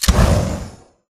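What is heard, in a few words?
Tick Movement Traverse Jets; Titanfall 2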